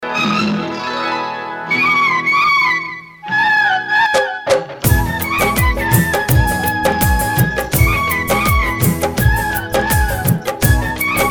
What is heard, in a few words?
Female